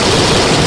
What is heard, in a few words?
rocket fly loop; hl2mp; weapons